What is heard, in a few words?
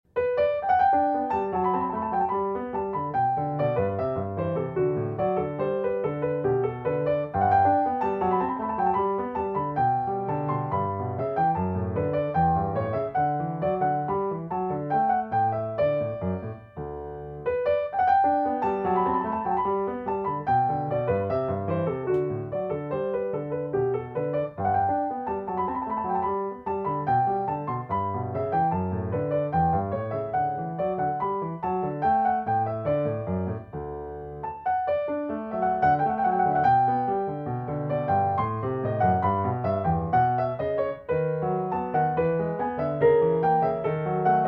French Suite No. 5 in G Bourree; J.S.Bach